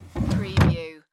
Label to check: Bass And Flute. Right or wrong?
Wrong